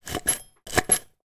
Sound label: food drink sweetener from dispenser into cup twice; sound effects one